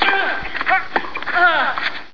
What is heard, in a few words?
tennis